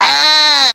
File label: scream cartoon; ooh ahh